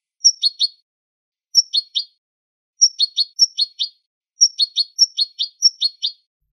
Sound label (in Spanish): adornos de padreo